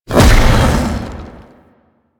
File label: Jump Launch; Warframe; The New War; Archon; Amar